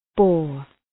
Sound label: boar